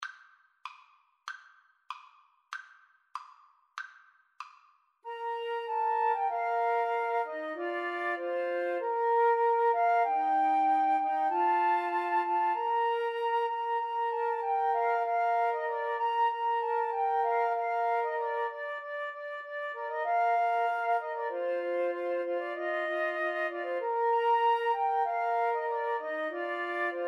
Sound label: joy to the world, Flute Trio